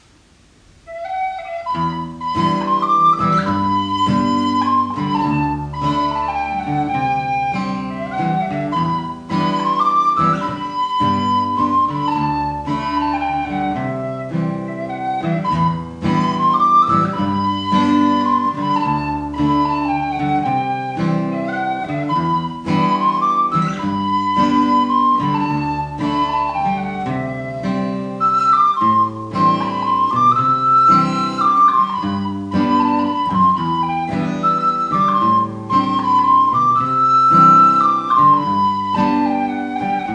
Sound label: Mazurka